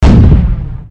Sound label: heavy cannon